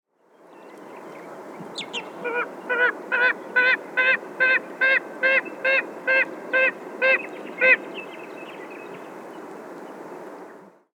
Tasmanian nativehen

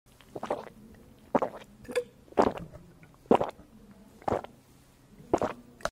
qolop qolop; قلوپ قلوپ

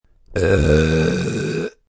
burp

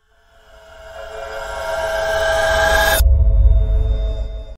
Riser; هیجانی; هیجانی رایزر

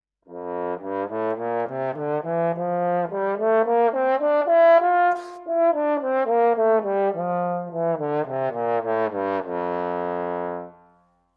trombone

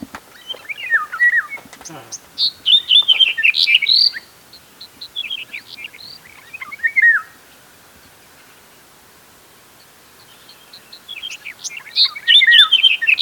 Black headed Bunting Emberiza melanocephala

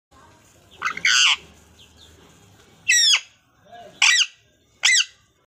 amazon parrot; طوطی آمازون

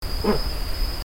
Sira curassow, پرنده کاکل فری سیرا

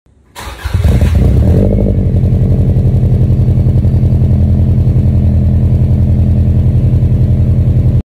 subaru

